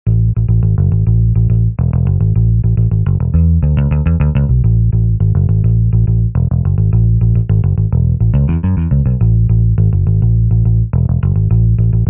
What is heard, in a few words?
Hard Rock Bass; Realsamples